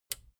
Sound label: plastic button small latch release